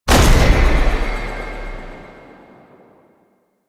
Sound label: Unhallowed Impact; Warframe; Warframes; Dagath